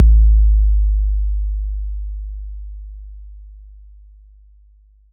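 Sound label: bass drum 808 Tube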